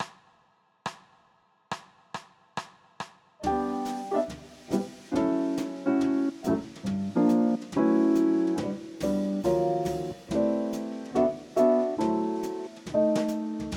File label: E Minor Blues No Bass Soprano; applied jazz ear training; Practice Perfect